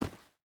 footstep boots stones run; Rust

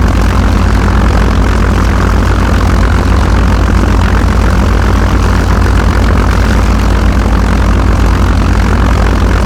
Aircrafts Engines DB605; DCS

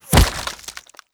bullet impact cloth flesh; Rust

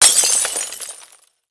Amb Destruction

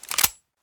pistol use; Escape from Tarkov

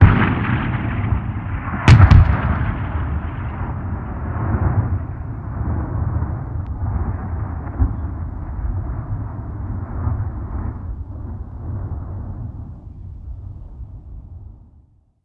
artillery med; ModernWarfare

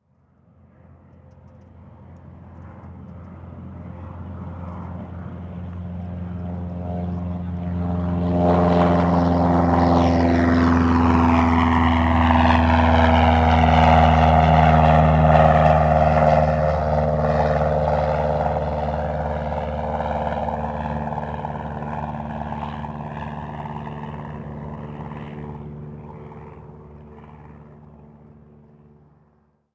plane; combat atmosphere; World of Tanks Blitz

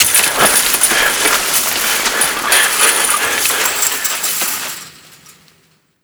wire drag

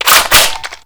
m82 reload chamber, foley

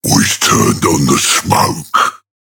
Ork Smoke Start; Warhammer Ork; Consumable; World Of Warships; Warhammer 40000

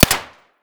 pb silenced indoor close; Escape from Tarkov

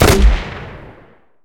grenade launcher; cannons; War Thunder

